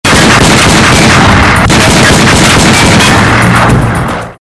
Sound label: shooting